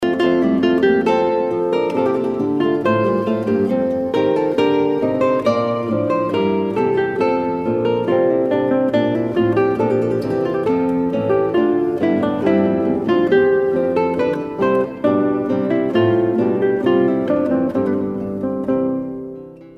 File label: Bach Courante